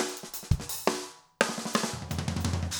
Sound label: jungle 170 fill; Sound Effect Library